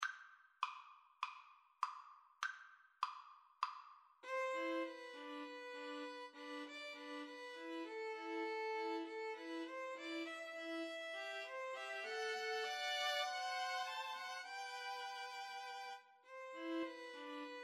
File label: string trio